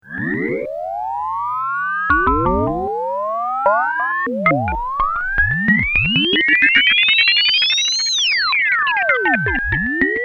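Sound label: ring filter delay; blacet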